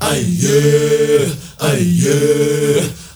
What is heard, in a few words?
MALE, HUMMERS; Spectrasonics; Heart of Africa 2; M.HUMMERS